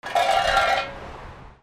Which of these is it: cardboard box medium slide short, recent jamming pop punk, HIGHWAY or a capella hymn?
HIGHWAY